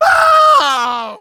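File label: Engineer pain critical death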